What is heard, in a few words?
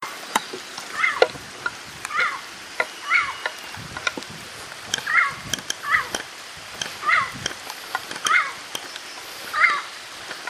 yellow crowned amazon; پرنده آمازون تاجزرد